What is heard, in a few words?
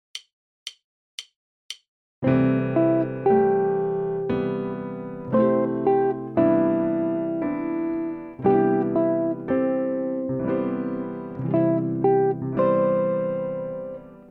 GUIT